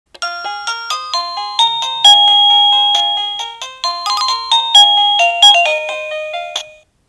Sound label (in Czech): melodie